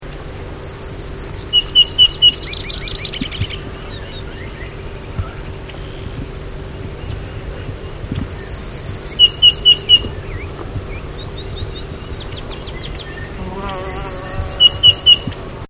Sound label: white collard seedeater song